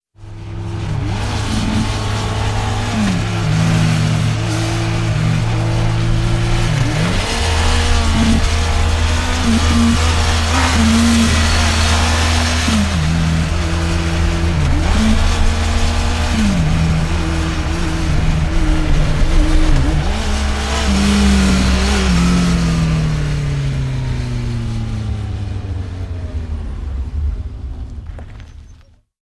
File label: Motorbike Honda 1100 On Board Travel; Various fast speeds - recorded from traveling car; Motorcycle; Tom Hutchings